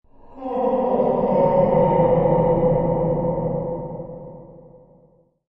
Ghostly Male Laugh, Ghostly voice laughing in the walls. Reverberant; Horror; eerie laugh sound; Lynne Music